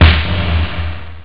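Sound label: snare reverb; jazz jackrabbit 1; M8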